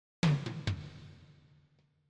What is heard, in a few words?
Toms Only Toms